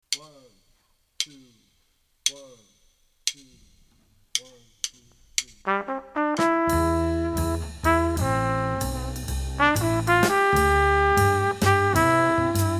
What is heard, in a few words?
minus piano; count off sets up the melody; comp for the melody; comp for the trumpet solo 2 choruses